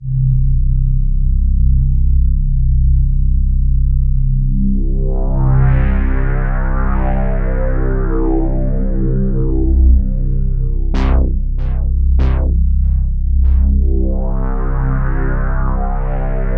C2, Synth Line; 90 s Sample CDs; NiceBeats; SynthLine VOL-25

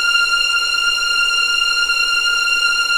F6 EXP MF, VIOLINS; East West; The Ultimate String Collection

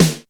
SNAREDRUM, D110 DRUMS; Crypton - DrumBase5000

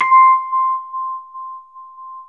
Chor Rhodes, C; E-MU Producer Series Vol. 5 – 3-D Audio Collection; 3DRhodes; 3DChorRhodes1.5

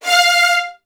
F2, VN 16TH, Virtuoso Strings; ILIO; Kirk Hunter

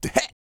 A YELL, MALE HUMAN; ILIO; Vocal Planet

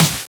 Snares; Vengeance - Essential Clubsounds Vol.4

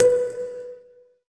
SYN PIZZ, Roland JD 800; Sound & Vision - Gigapack I CD 2; JD 800/990

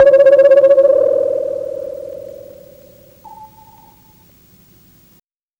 HEAVEN, fx & sweeps; EZRollers

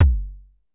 Drum X Kick, Pearl Drum-X

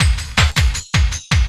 Loop, Drum & Bass, 160 BPM

